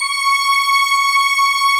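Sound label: celesta G5, M-1; Greytsounds VOL-1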